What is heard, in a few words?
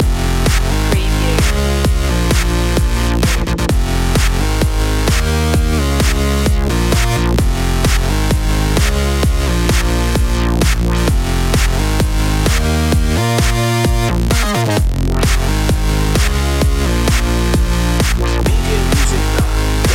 sandy shoes loop